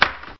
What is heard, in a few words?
type key, clicks